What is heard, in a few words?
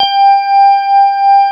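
CX3 organ G1 L, Keyboards Old School; USB Soundscan vol.09 - Keyboards Old School; AKAI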